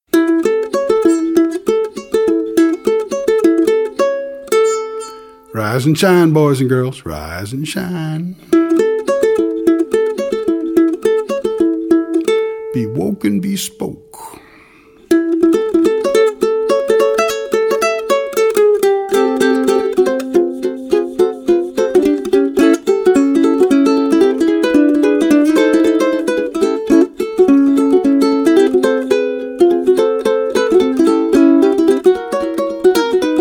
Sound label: Ukulele